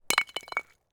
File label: ice block drop; nature